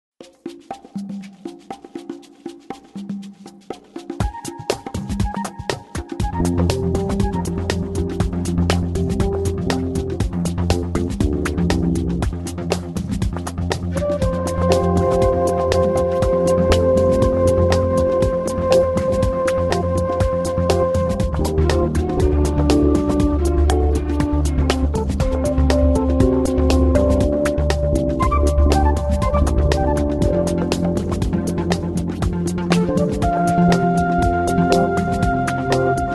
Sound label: contemporary urban pop ragga